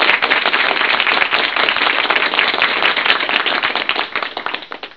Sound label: KIDS clap; T.J. Finds a Friend